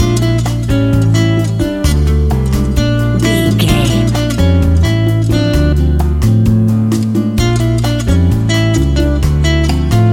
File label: smooth latin jazz guitar; An exotic and colorful piece of Espanic and Latin music. Great for Zumba parties